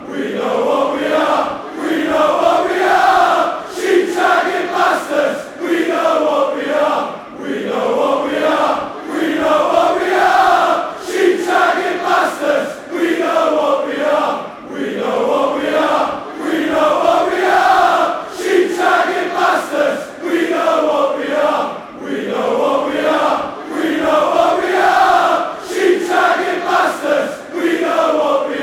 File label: fan chants